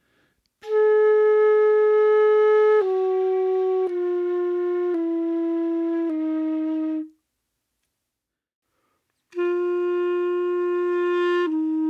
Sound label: Horn Break Parts